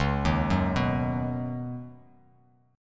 Db 7th Suspended 2nd Suspended 4th, Bass Standard chord; JGuitar